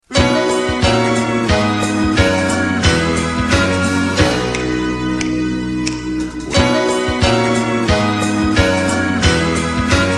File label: ringtone